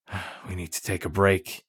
tired; Escape from Tarkov

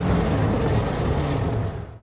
engines; World of Tanks

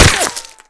impact dirt; Modern Warfare

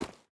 footstep boots dirt run; Rust

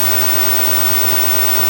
tv static

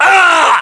death, english; voiceovers

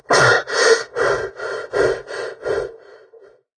redout long; War Thunder

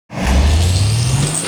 mohole beam start

